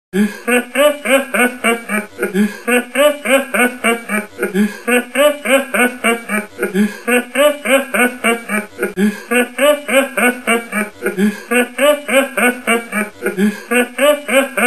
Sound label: laughing